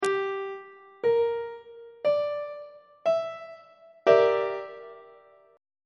e half diminished 7th 1st inversion on treble clef